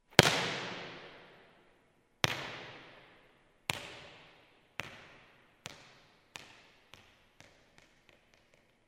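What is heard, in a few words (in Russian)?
удар по мячу в волейболе и мяч подпрыгивает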